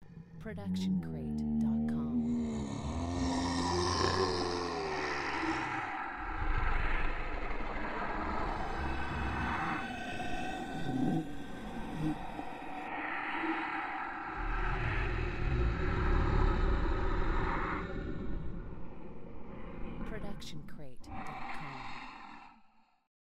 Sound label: zombie; soundscrate